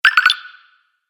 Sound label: Fantasy Game Collect Sound, video game